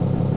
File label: engine, tank